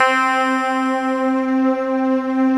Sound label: SYN 800B, Roland JD 800; Sound & Vision - Gigapack I CD 2; JD 800/990